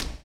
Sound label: STOMPS